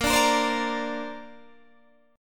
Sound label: A sharp Major Add 9th, Guitar DADGAD